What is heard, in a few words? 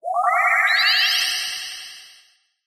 cartoon anime swell rise electronic